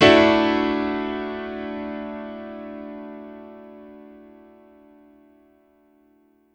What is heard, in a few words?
Piano stack (D) maj; Sonic Foundry (Sony Creative Software); Ugly Remnants VOL-2